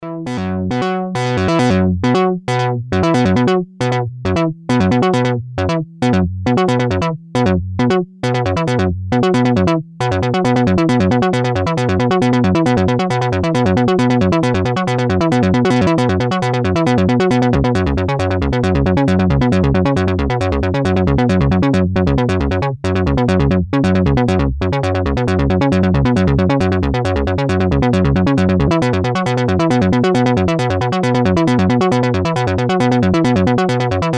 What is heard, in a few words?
Trigger Sequencer